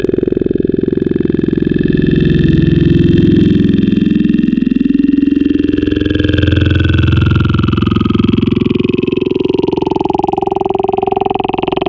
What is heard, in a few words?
Formant Hill, wavetables; Cymatics; SERUM; Vibes Wavetables